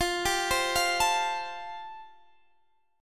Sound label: A-Minor 7th Sharp 5th F